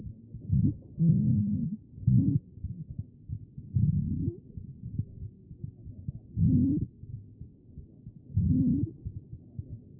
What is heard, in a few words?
CHEST pos4 B MODE